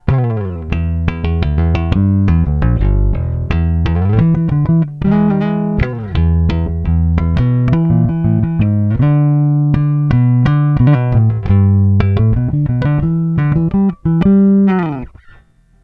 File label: Middle finger